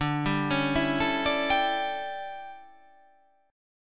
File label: D Major 7th Suspended 2nd Suspended 4th D